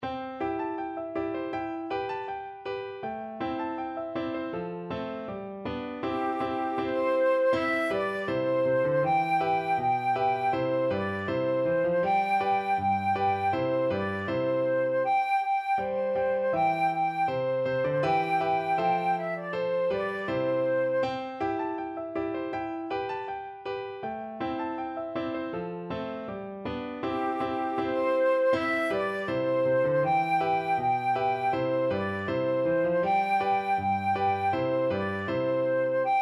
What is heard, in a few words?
ba luobo flute